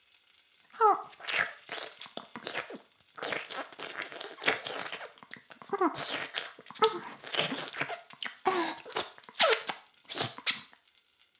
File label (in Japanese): フェラ音声; 古き城のドラゴニート2